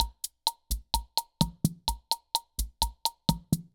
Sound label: Guild MG10C-1 Loop, Drum Machines; Guild MG10C-1 Sample Pack; 300 Drum Machines